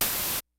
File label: CLASSIC, DRUMS; Kid Nepro; Elite